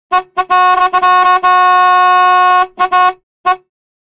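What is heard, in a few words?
Horn Honk Rapid Series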